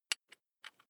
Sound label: electric drill bit fasten wrench apply